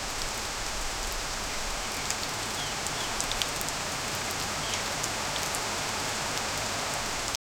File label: heavy tropical rain, frog croaks